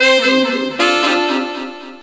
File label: Sax Section Effect, effected sax section; Sonic Foundry (Sony Creative Software); Jazz Solos and Sections